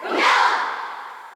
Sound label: Chant